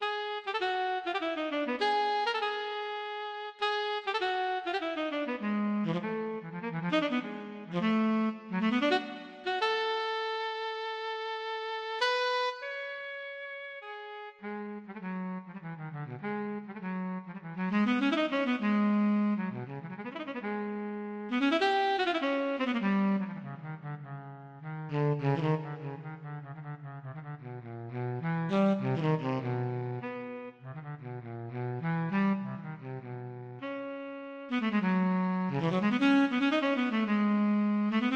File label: tenor saxophone